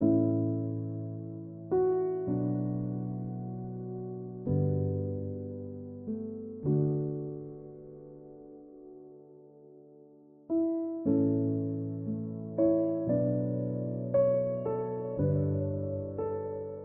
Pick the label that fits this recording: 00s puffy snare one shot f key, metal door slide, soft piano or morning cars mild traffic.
soft piano